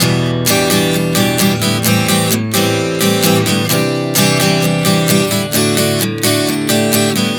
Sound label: Em7-Am7-C-D 130 bpm, acoustic guitar chord progressions